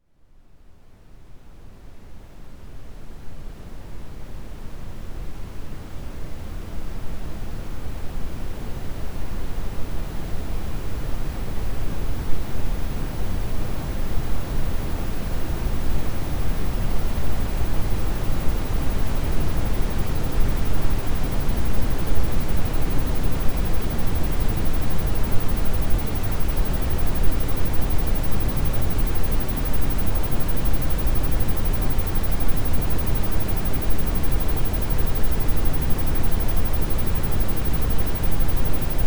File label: Brown Noise - Meditation; RobinHood's AS Sessions